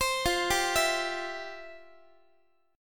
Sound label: F Major 7th Suspended 2nd F, Guitar; arpeggiated; JGuitar